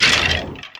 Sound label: Monster Skeleton type Death; Inotia 3